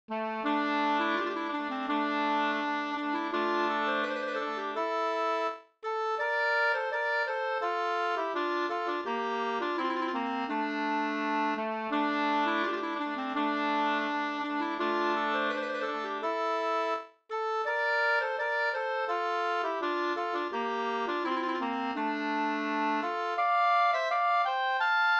skanklat

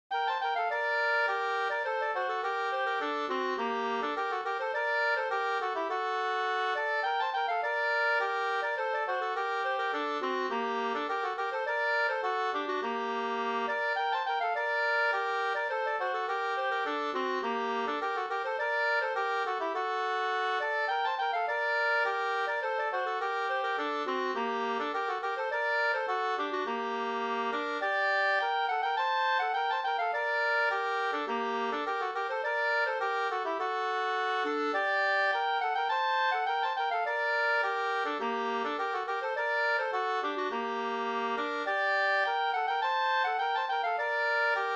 polska Brudpolska

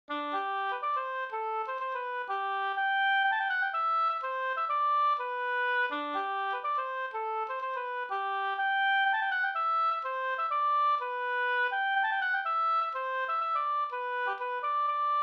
hamburska; Nar jag dansar med min lilla van; Leffler 38

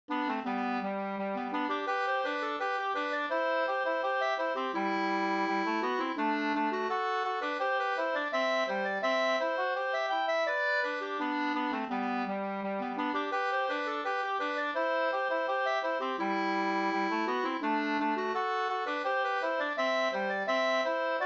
snoa; till Doris av E Fyhr Estenberg Orsa

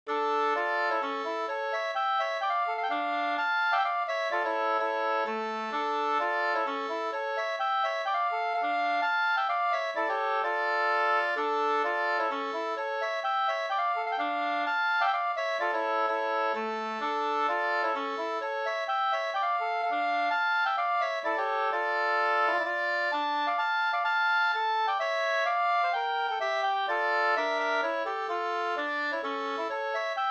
polska

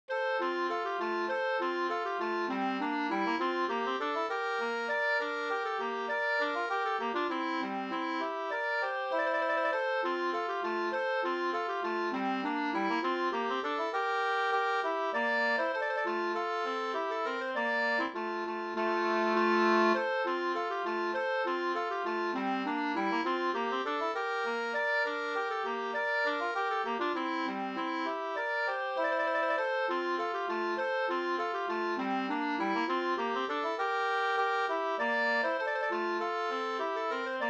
polka polkett, 2st

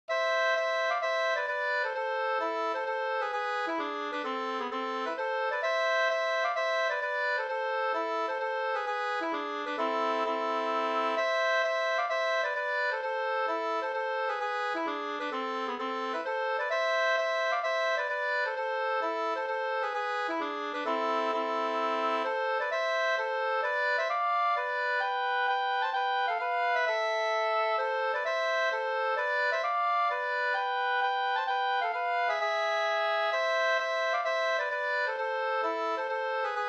hambopolska amoll

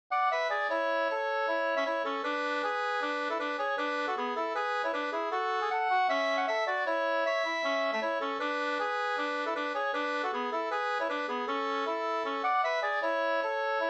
schottis; Edholms schottis av Anders Eriksson An V1 2st

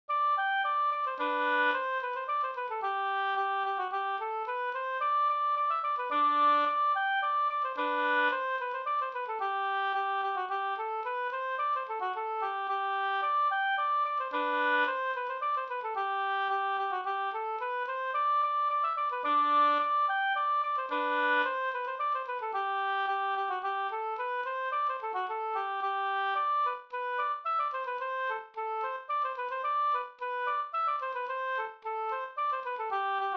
slängpolska

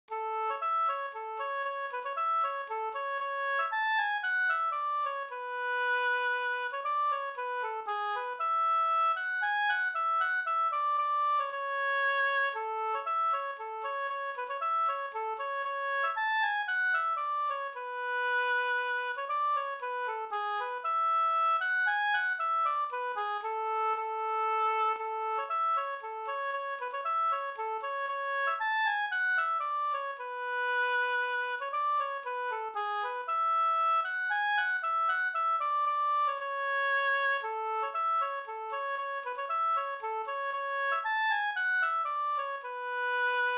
polska Bodapolska

